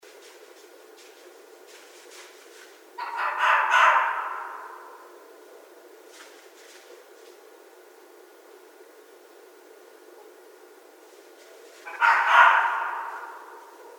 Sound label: Red Fox